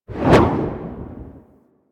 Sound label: Smoke Screen Cast; Warframe; Ninja